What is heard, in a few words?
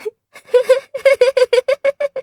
foreboding giggle